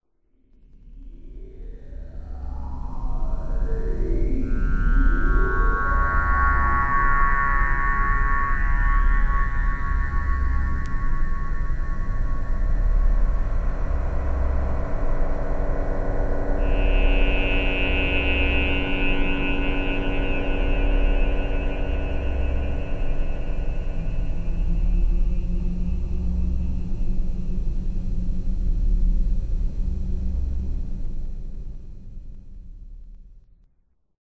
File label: ping